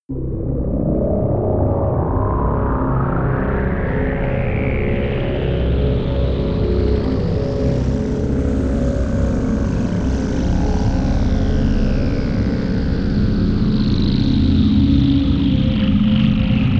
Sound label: drone juicy